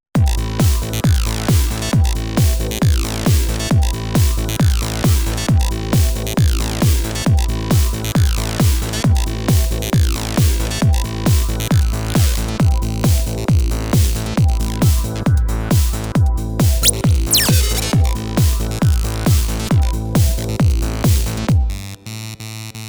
Dist Seq

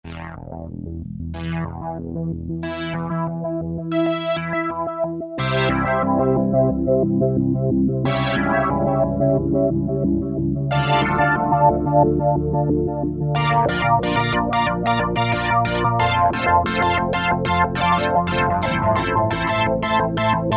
Kawai SX-240, MODULATE

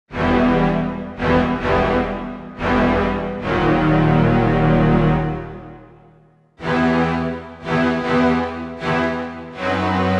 Low Strings, Korg MSC-1S; Korg MSC-1S Variety

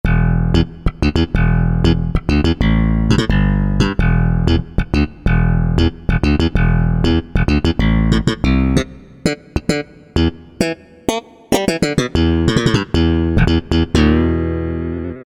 Slap 1, Roland U-220; Factory Timbres

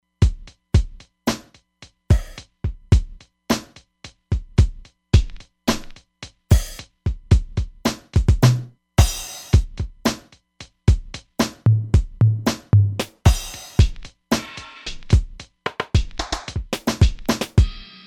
HipHop Kit 2, Roland Fantom XR; Drums

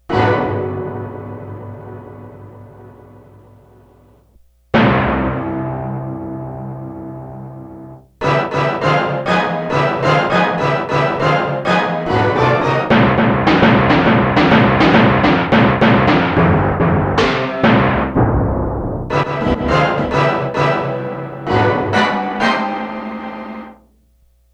HIT

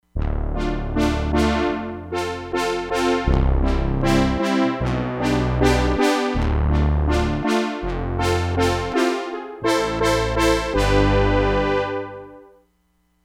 ENSEMBLE BRASS; Factory Programs

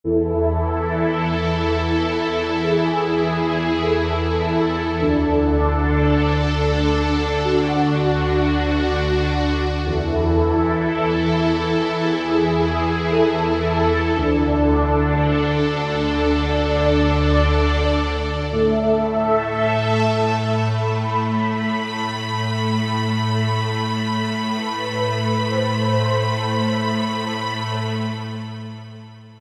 Wave 2001, Choir & Pad; Factory Voices

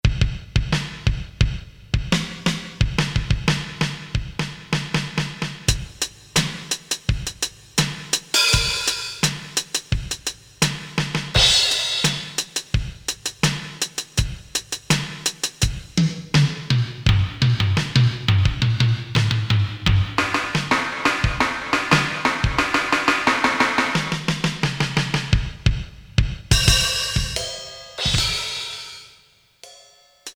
Drum

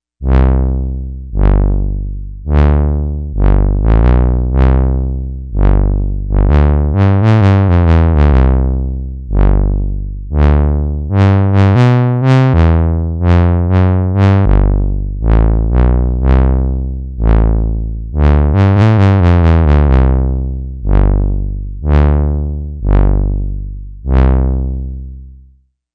BASS III TUBA; Prodigy Sound

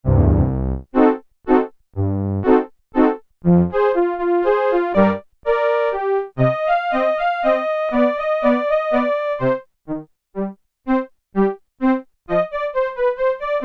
Bowed Violas, Korg Poly-800

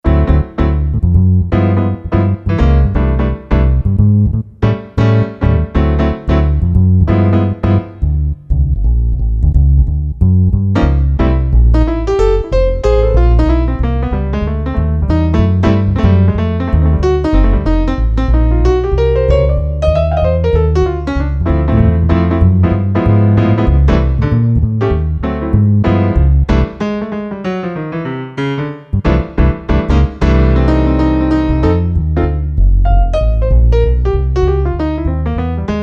PIANO & BASS, Roland SC-880; Factory Patches

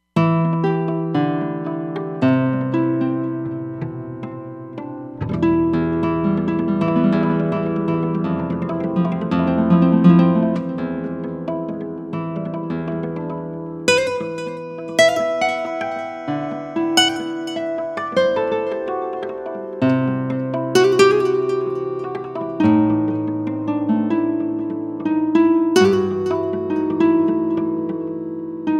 Nyl Intro, Roland Fantom XR

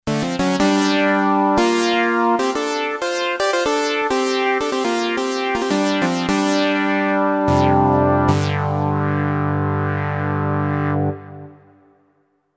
Aba-cap, Roland V-Synth

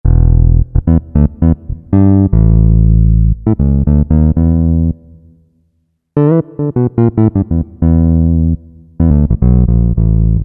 Finger Bass, Korg MSC-9S Organ

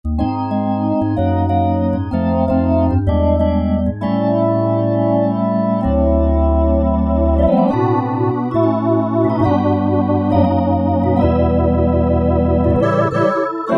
Full, Drawbars

